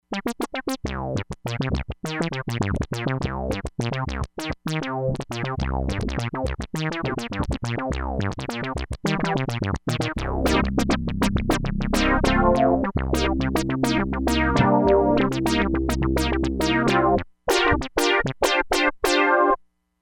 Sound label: FUNK TOUCH, Crumar Bit 99; Factory Programs